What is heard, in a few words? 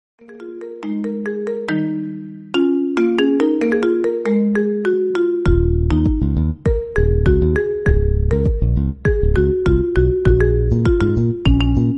iphone iphone